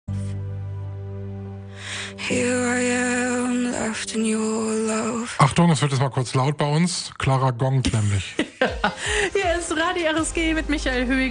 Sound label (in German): klangschalen